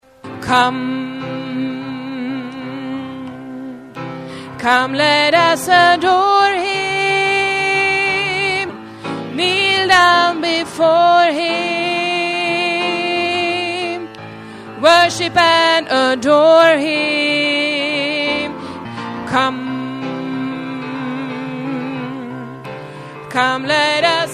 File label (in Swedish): bas